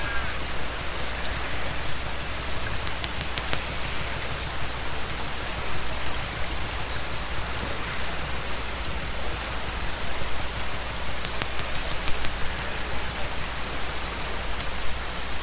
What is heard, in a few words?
Killer whale clicks